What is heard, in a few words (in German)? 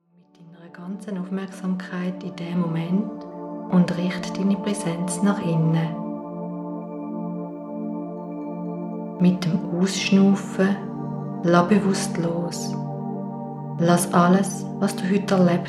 Meditation Hoerprobe